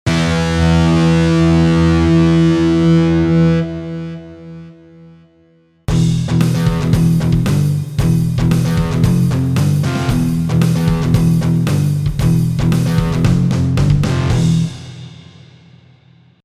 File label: Power Chord Groove